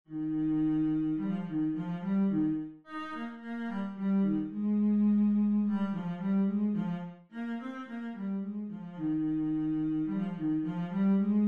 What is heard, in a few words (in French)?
sonatine; beethoven ludwig van